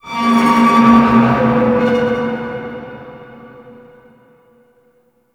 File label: Metal Wronk; Props; Warframe; Tau; Ambience